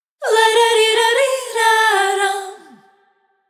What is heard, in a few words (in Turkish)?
Isitsel Kimlik Vokal; Bursa